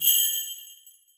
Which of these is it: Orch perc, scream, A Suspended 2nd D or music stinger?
Orch perc